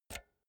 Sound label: pull out jack multi socket; objekte; elektronik